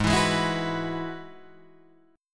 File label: Ab Minor 7th Sharp 5th Ab, down, Guitar, DADGAD